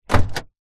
truck small door open handle plastic, vehicles

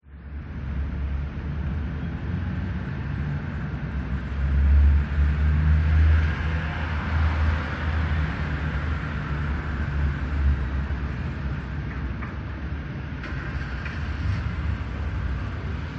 House Residential; audio hero

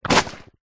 cow pat poop land ground

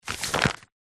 magazine cheap pick up; household; zapsplat

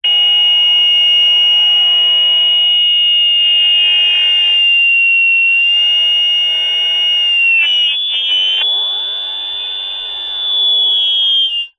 technology cb radio two way feedback

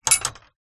gate wooden metal latch lock close; household

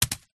food passion fruit fall from tree onto ground; zapsplat

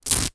foley baseball cap velcro adjuster strap rip; zapsplat

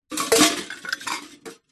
impacts metal cooking pots hit drop crash

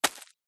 footstep single ground farm hay dirt; foley; zapsplat

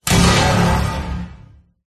Sci-Fi Explosion; Ryan Van Eerde

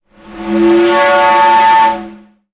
sound design effect air pipe pressure

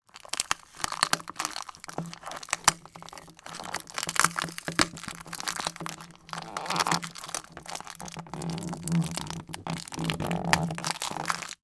removing item taped to double glazed window; foley

